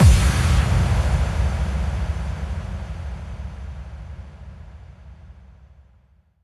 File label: 138 BPM Boom; Sound FX; Bminor